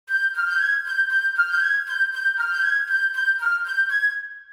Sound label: SFX Wood Wind Melo; Tevi